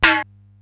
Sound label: SDS9 RM, Simmons SDS-9, Rimshots